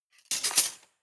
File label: cutlery large multiple drawer place soft